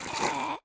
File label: Blob Appear